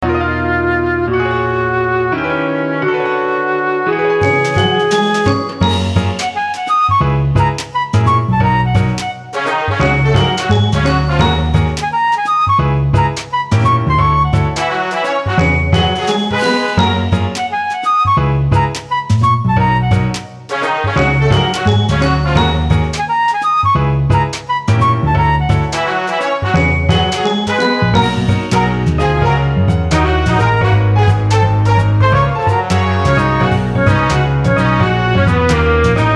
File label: marcato